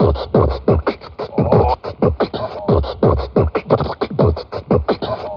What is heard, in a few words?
awful sounds, Beatbox, Drum loops